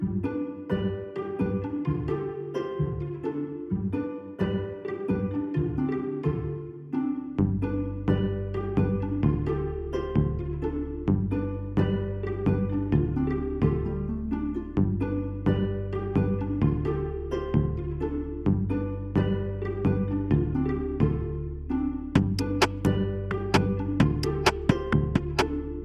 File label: Ambient bgm; onisep